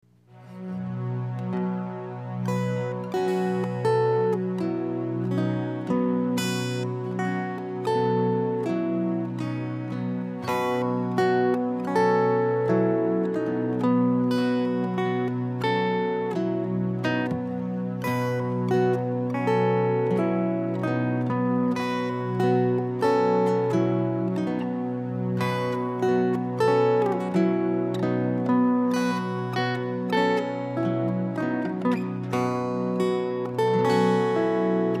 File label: Drone, guitar